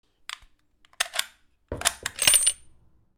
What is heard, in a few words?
Sniper rifle round ejecting